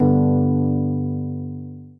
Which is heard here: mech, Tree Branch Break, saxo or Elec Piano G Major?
Elec Piano G Major